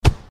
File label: break Sound